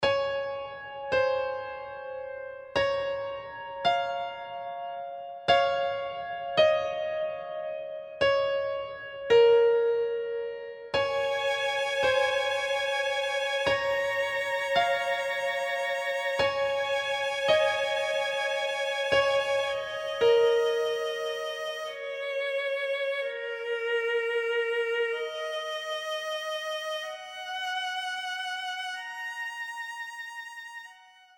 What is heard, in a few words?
Piano Strings